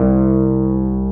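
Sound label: Wurlitzer C2 - R; USB Soundscan vol.09; AKAI; Keyboards Old School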